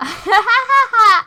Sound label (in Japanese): 笑い